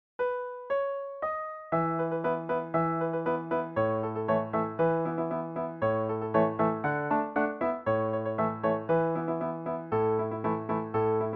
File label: mazurka